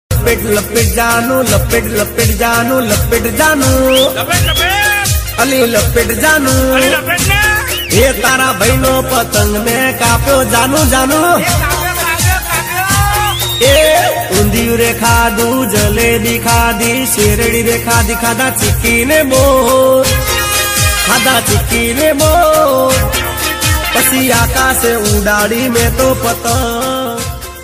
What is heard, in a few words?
gujarati uttarayan ringtone